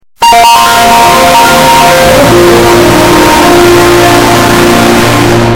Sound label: windows 2000 chime